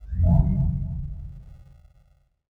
hacking interface activate echo

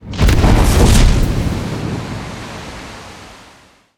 damage hits explosions; World Of Warships

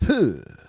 Mod Art Fort Vox; Civ4 warlords

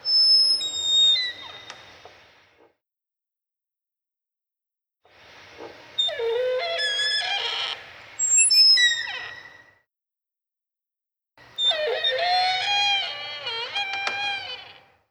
shutters swing

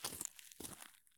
soil turn; Escape from Tarkov

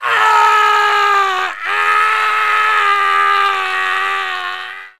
beartrap scream; Rust

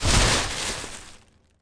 hay stack; World of Tanks Blitz

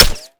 concrete small

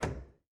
stick pitch lim, aircraft; DCS

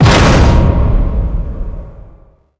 dfa land

